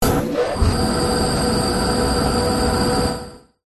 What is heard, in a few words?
tek science fiction machine on start up whir; Audio Mangler